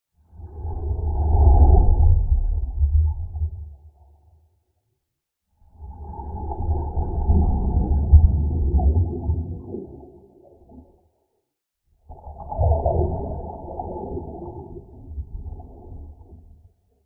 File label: underwater move swim; lukas tvrdon